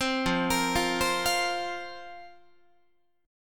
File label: F Suspended 4th F, Guitar; Fsus4; arpeggiated; 15,13,13,15,16,16